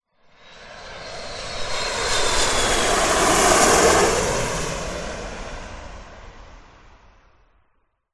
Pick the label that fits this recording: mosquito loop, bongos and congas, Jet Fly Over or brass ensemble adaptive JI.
Jet Fly Over